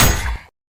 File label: parry; ultrakill